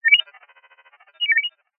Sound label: science fiction radio receiver transmission interference burst chirp; zapsplat